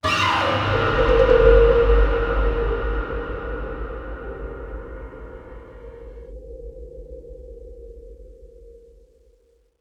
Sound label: Game Over